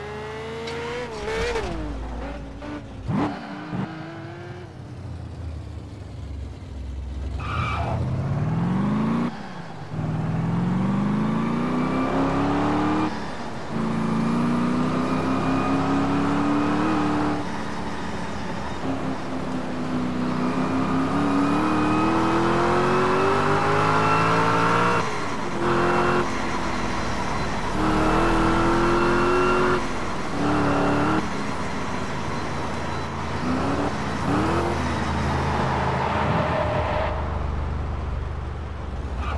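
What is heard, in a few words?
ford shelby gr-1 concept, car; TDU-1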